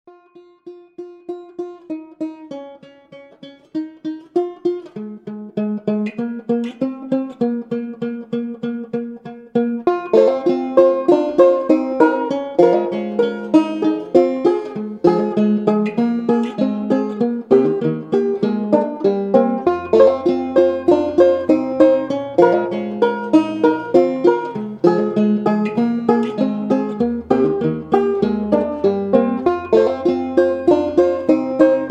slow twang; weekly beats 2020

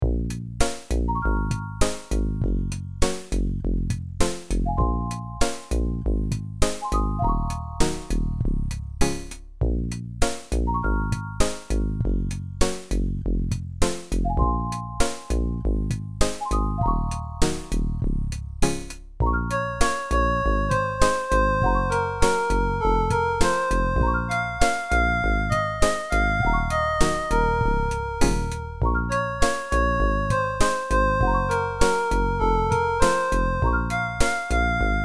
one synth challenge juce opl vsti

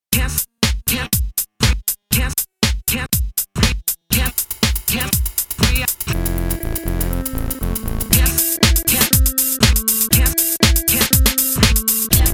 scratched; weeklybeats-2016

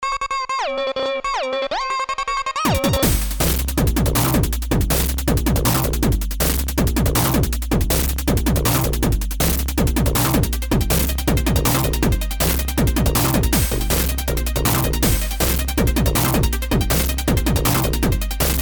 m8 fm practice

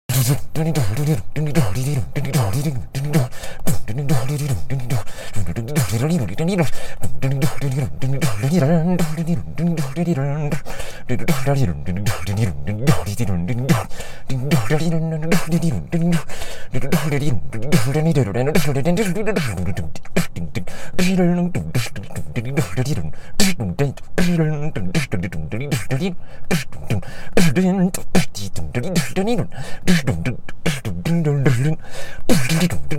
beatboxapella vehicular discotheque; weeklybeats 2026

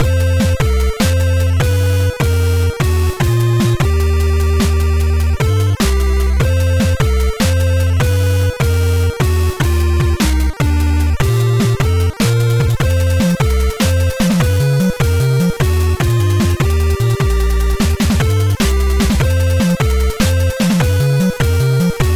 monorhythm; weeklybeats 2026

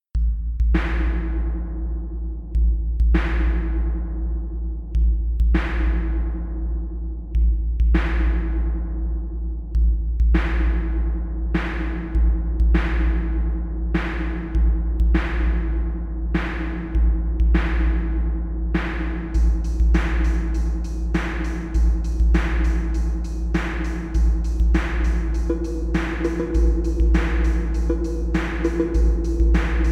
stormy

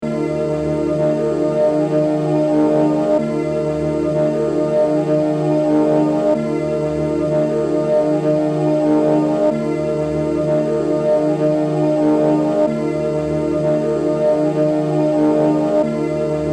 pad; weeklybeats-2014

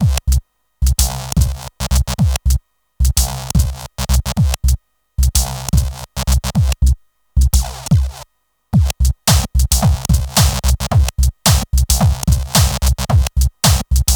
nano glitch; weeklybeats 2024

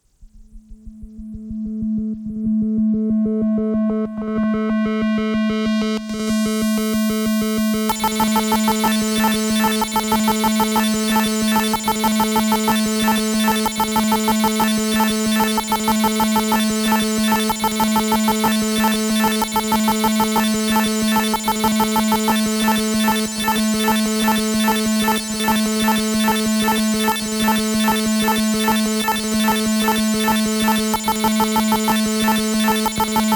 howl; weeklybeats-2020

